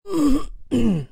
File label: Grunt